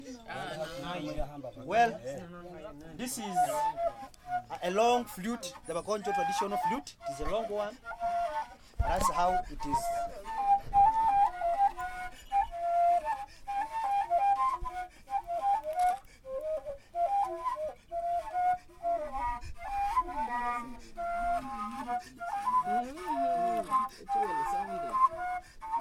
long flute solo, Southern Uganda Rwenzori Mountains